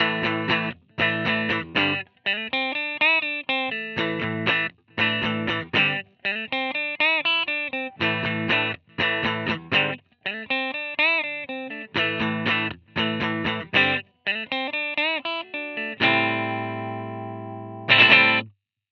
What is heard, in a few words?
Silver Tone, test